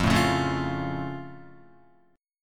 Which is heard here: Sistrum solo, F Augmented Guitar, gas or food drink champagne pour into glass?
F Augmented Guitar